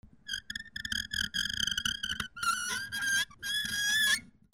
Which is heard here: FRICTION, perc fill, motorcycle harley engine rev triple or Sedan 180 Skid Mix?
FRICTION